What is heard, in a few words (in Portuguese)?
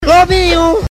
lobinho